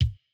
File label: kick; Reference Beat BPM120; j city pop 1980